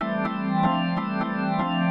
SYNTHPAD, HOUSE, 125, A; eJay; Sphere

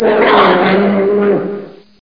garg spot

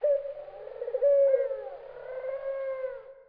Dove Amb; specops

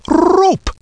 Rope; PUZMANIA

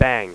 pistol

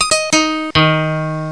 Nylon Guitar; musicmousedemo

